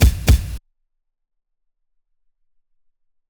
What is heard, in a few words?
drums; last of the drums; Pony Fan Music Remix Stems